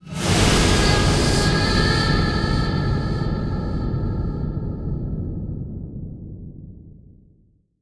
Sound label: hivemind show planet